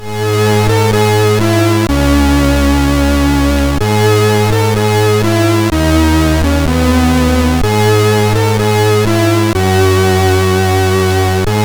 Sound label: tune, MOS6581R2; Reset 2 tune 9